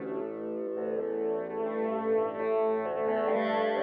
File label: SYNTHPAD ETHNO 125 A; eJay; Sphere